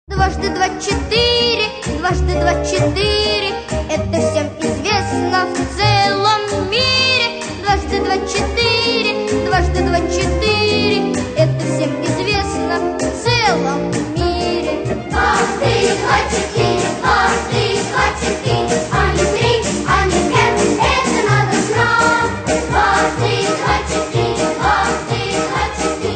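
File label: detskij hor, children's choir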